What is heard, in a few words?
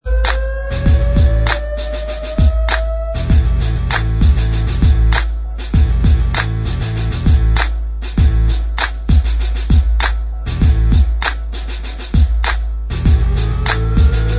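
instrumental, backing track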